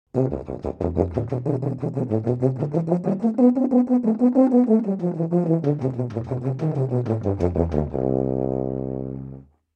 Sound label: Tuba tension